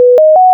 boot up

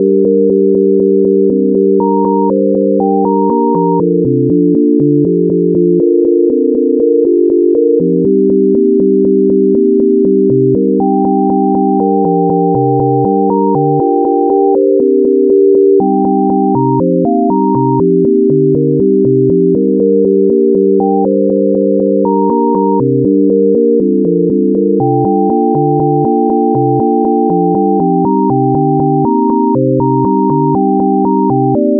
sine minor pentatonic; Minor Pentatonic Scale; music generation library in Rust

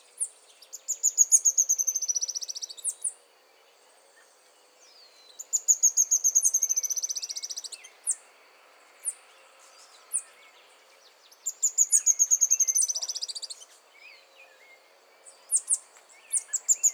spinetail

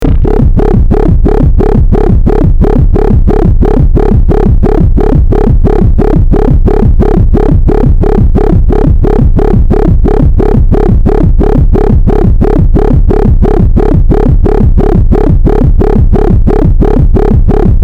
raw bass

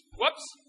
whoops

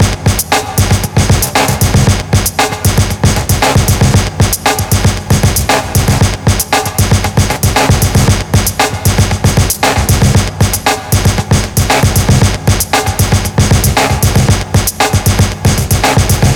loop; infinite-state-sample-pack; Awaken 116

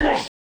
hurt; minetest game

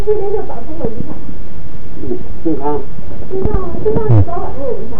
abnormal sample, respiratory sounds

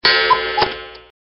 Cuckoo Clock

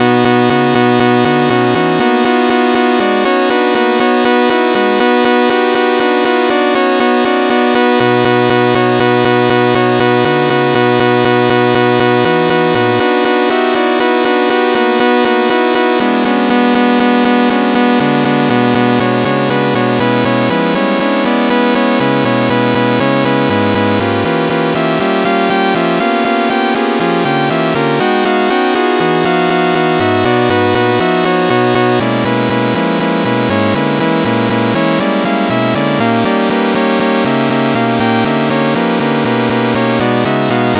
sawtooth diminished; Diminished Scale; music generation library in Rust

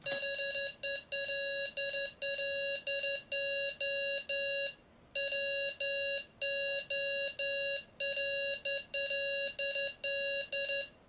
hello morse

